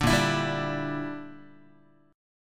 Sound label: A sharp 6th Flat 5th, Guitar; A#6b5 strummed; JGuitar